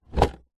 board game box open; telstrations

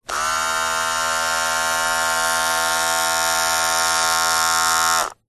household hair clippers electric on run off short

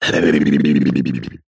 cartoon voice grumpy head shake or lip wobble

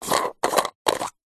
food vegemite plastic jar lid remove

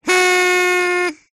foley party whistle horn blow unenthusiastic weak; zapsplat

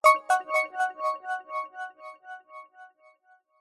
multimedia game sound nature game generic

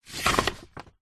hospital xray sheet place slide into envelope

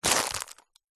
foley packet plastic cat ball bell pick up; zapsplat

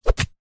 spear land ground; zapsplat

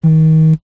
household mobile phone vibrate held in hand; zapsplat

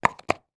baby toy wooden puzzle piece place in board

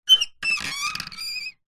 wood handle turn creak squeak; foley

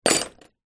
industrial metal hacksaw junior down on surface

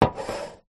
cola can open full set down slide table

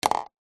container small plastic empty down on wood

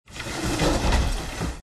open wardrobe; fox audio

